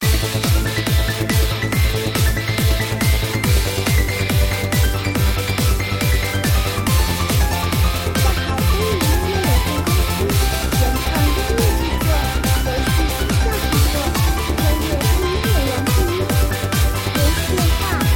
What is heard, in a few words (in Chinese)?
激烈-电子-战斗-日系